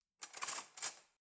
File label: cutlery knife drawer rattle soft